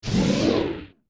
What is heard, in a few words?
Retreat; Biri; zelda; OOT